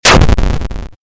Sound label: game explosion; arms